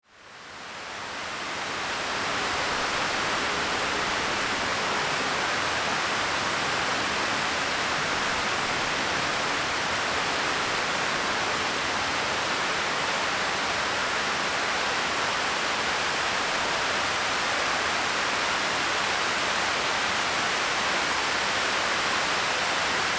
rain with thunder sound

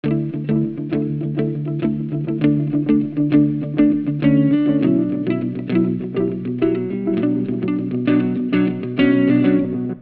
relaxing guitar loop

